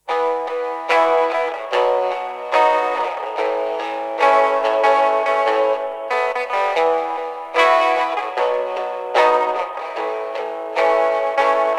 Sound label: Lofi; Boss RV-200